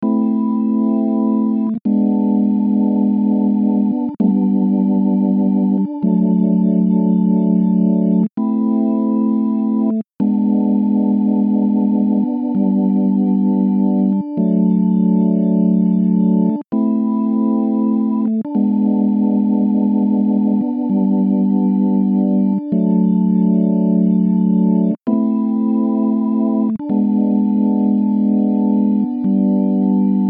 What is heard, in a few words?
one handed organ pads